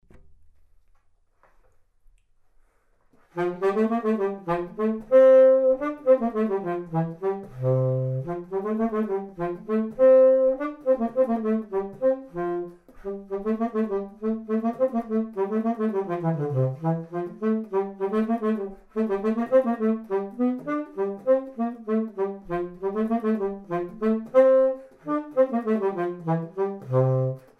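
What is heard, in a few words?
tenor saxophone dance; tsax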